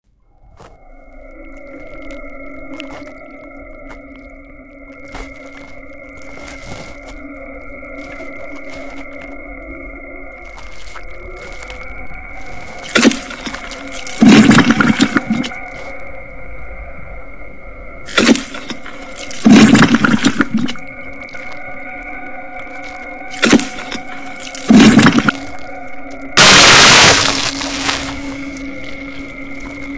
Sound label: monster; mysterious creatures of the dark planet